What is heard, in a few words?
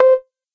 beep